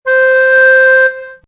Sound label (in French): vent; Mobiclic51